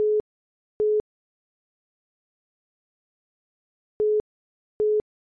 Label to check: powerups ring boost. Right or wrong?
Wrong